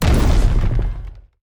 Monster; Zenless Zone Zero

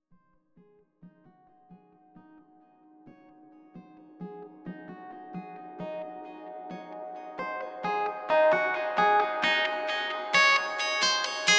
Acoustic Guitars

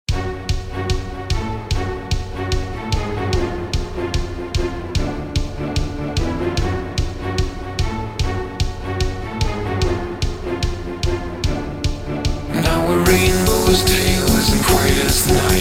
Symphonic Metal

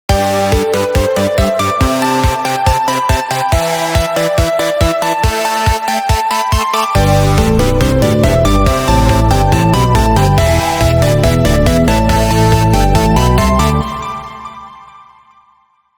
Cheerful Melody; Pony Music Archive 20.09

